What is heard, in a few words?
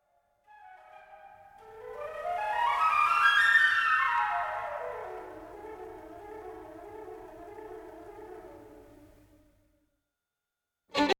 flutter tonguing